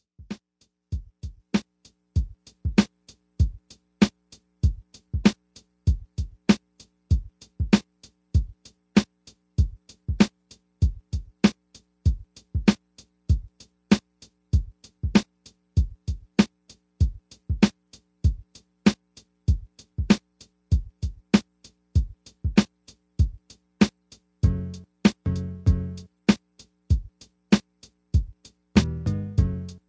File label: MPC Beat